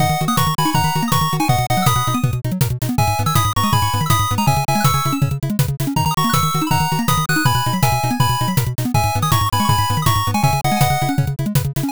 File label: bgm drive